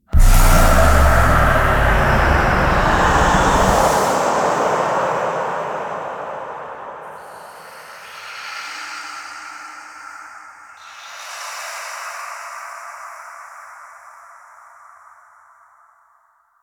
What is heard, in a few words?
Kiss; Ballas; Warframe; The New War; Throne Room